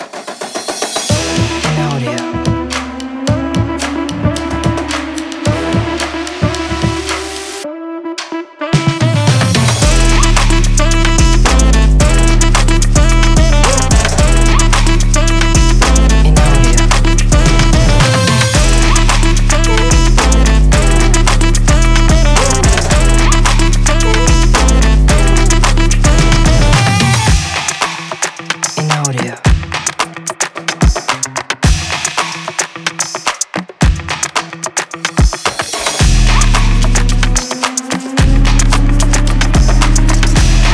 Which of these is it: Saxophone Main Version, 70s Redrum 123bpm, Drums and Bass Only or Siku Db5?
Saxophone Main Version